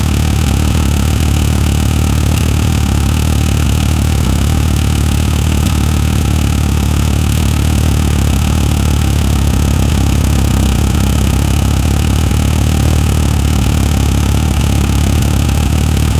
rally car engine idle 3k

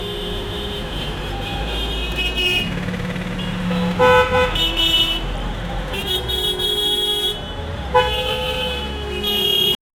honking cars in india

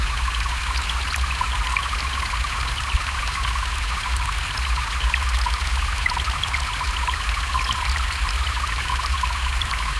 a gentle looped babbling; consistent

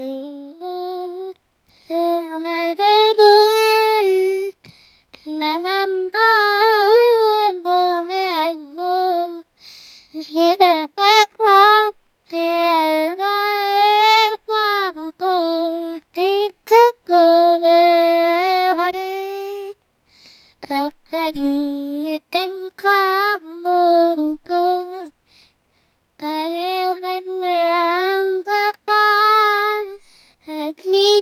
little girl sings; children's songs

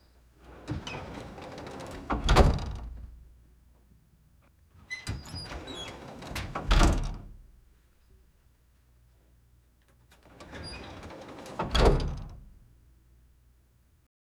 glass door opens loudly

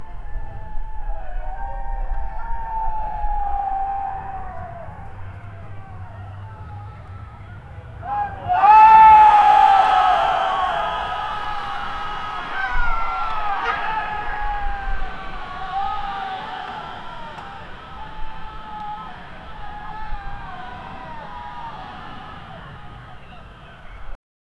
crashing wave shouts of a; chaos

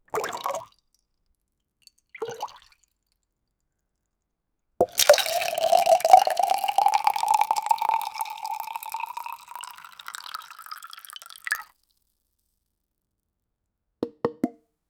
water bottle pouring drop; everyday objects

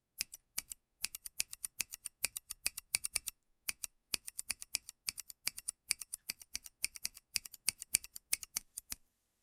soft professional hair cu, hair

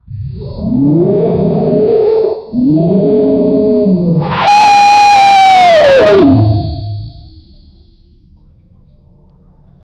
t rex roaring; dinosaur

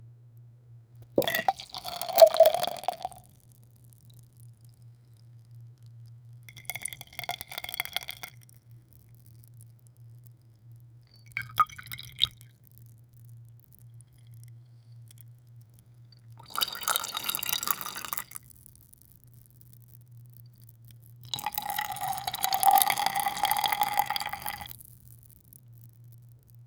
slow whiskey pouring into; liquids

